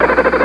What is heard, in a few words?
gopher